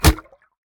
hit; smite; ganesha

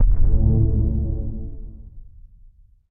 magic dark; smite; cabrakan

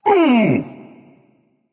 ouch; smite; kukulkan

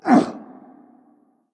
hitreact human male small

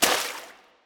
defeat splash; smite; khepri

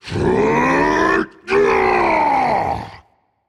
battlecry rage; chaac

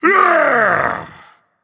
Yay, Dragon; fafnir

